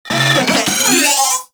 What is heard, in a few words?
Moa Vocal Ability; Warframe